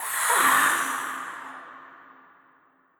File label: Scream; Vampire Run1